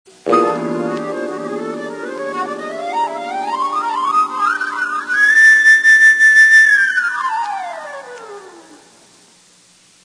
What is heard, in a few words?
fanfare